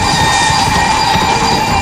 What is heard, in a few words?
IMPACTS, TARMAC; RallyC